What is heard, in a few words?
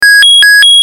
alarm